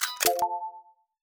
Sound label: buy item; Counter Strike Model Pack; CS:GO; survival